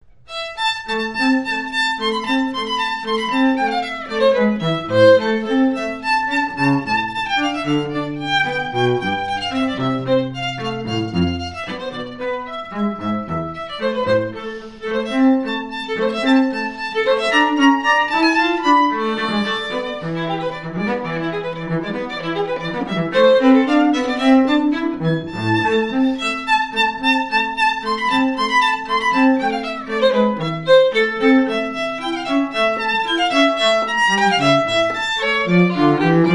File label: strings a minor concerto; carneval